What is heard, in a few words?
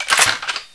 m134 clip on, weapons